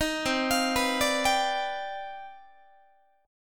C Minor Major 11th C down Guitar 4A 4E 5A 5D 5G sharp 6C sharp; CmM11 arpeggiated; JGuitar